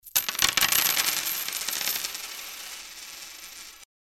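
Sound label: medium coins scattered on a table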